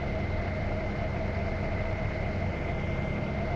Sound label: Cruiser Engine Run